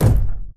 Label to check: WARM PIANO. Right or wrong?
Wrong